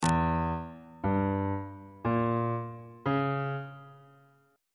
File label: f harmonic minor 7th chord 7 is e diminished 7th on bass clef